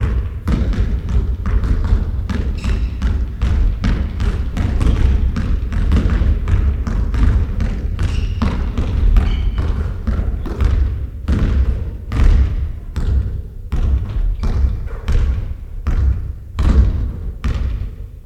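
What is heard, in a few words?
basketball dribbling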